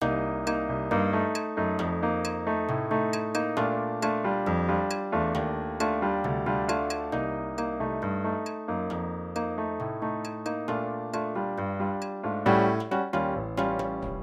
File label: Nova Bossa percussions dinamics